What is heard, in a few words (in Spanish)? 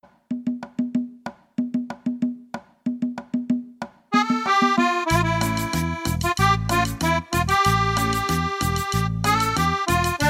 Cumbia; ritmos